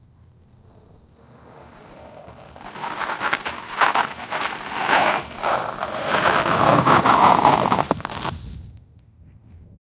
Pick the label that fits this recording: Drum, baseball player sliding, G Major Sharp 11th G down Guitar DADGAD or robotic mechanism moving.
baseball player sliding